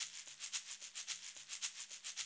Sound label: 110, Classic Drum Loops